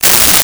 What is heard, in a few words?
Index Card Tear; Office Supplies; 1001 Sound Effects